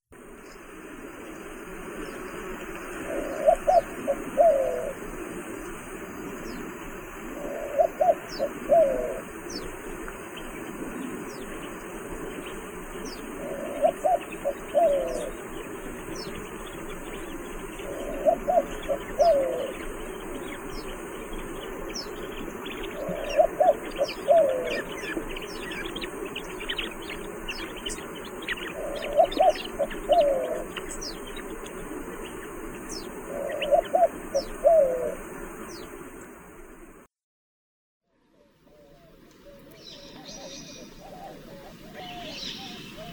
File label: zenaida asiatica